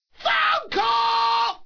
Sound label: shot clock alert